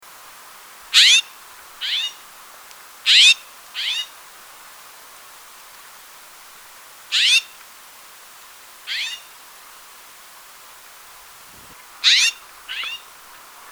Woodhouses Scrub Jay, Aphelocoma woodhouseii woodhouseii; jays and crows